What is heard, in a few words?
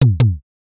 door knock two